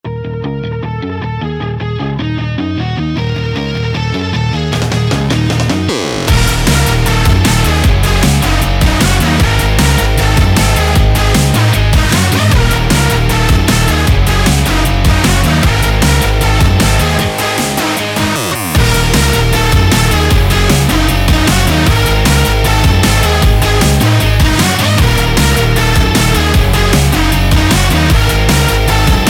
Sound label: Speed Thrill Beat